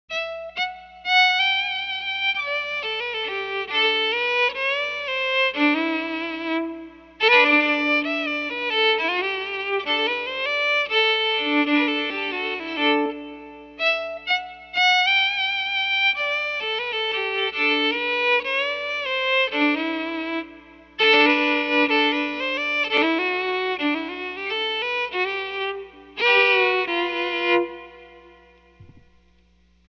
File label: Electric violin; Silkyn Tube Amp